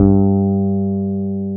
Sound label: FEND P-BASS G2